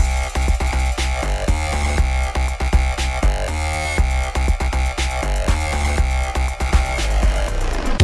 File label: win; murder mystery 2